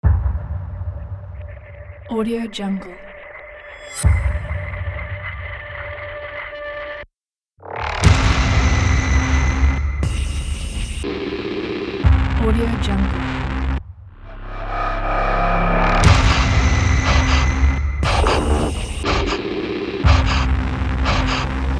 thriller and horror

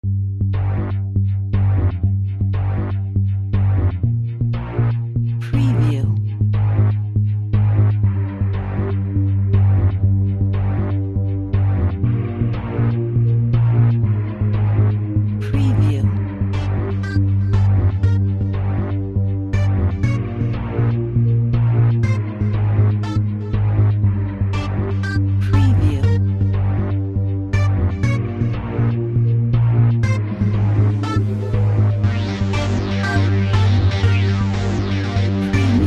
spooky horror video game

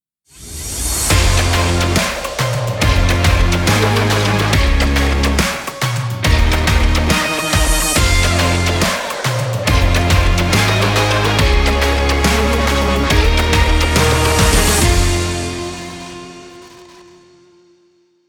sports intro

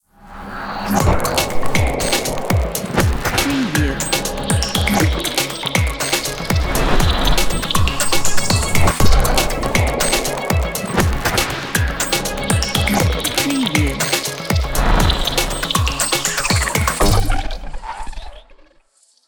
glitch logo

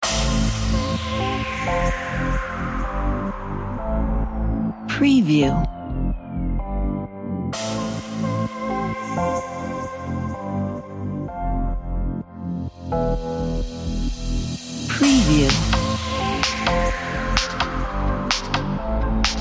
edm house pavement

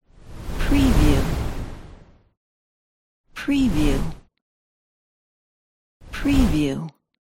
swoosh transitions

